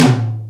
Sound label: Tom M, Akai MPC-60